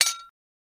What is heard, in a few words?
Metal Impact Pipe Wr